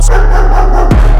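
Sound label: barking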